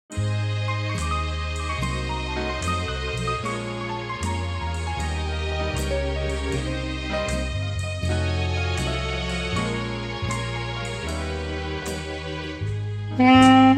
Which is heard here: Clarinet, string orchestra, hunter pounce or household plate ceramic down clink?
Clarinet